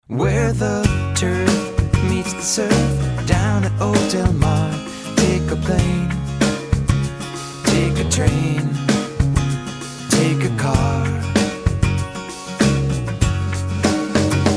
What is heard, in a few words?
Acoustic